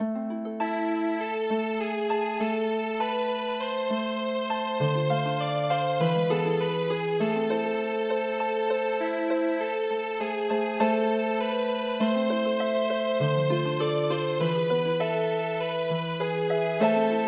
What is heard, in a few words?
Healing Harp, midi; May Dreem